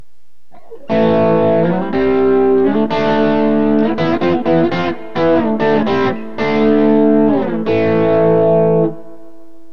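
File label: dirty fingers bridge, guitar pickup; burny; fugly clips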